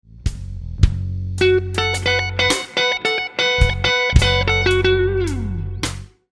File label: Lick, guitar; Slow Minor Lick 4; Minor Blues licks